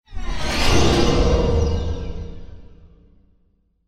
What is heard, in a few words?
Horror